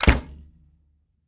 Arrow Release, Longbow; SteamVR; InteractionSystem

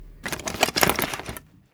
Arrow Spawn; SteamVR; InteractionSystem; Longbow

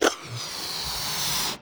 Balloon Inflate; SteamVR; InteractionSystem; Longbow

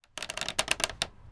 Longbow Pull Back; SteamVR; InteractionSystem

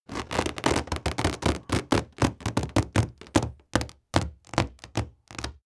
Balloon Rubber; SteamVR; InteractionSystem

